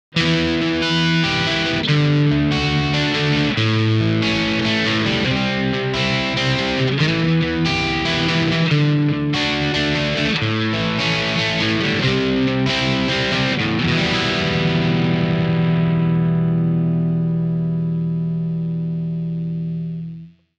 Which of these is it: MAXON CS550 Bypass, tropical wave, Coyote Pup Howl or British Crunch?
MAXON CS550 Bypass